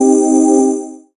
pad, Dance Synths; Break Pads; Best Service ProSamples vol.37 - Dance Synths

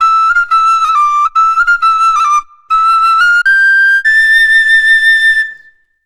Penny Whistle, Celtic; Sonic Foundry (Sony Creative Software); Continental Drift Aftershocks

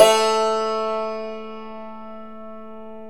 DLCMR A2, Stereo Dulcimer

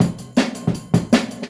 Jungle Breaks; Original Jungle Breaks

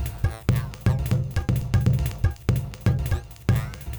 Candy Machine, Processed Percussion

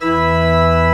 E2, CHURCH OR; Best Service; Advanced Media Trax Modern Composer

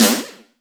snare; Zero-G; Chemical Beats; SNARE ZOO

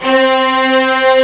STR STRING, Orchestral Strings

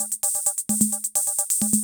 CR78

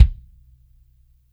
DEEP DRY KA, DRY KIT; Spectrasonics - Burning Grooves CD2

